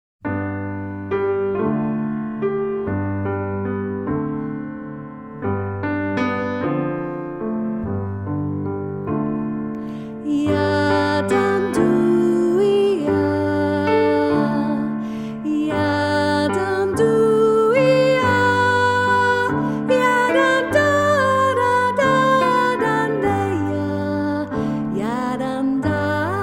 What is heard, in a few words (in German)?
1. Stimme; Ja dan duia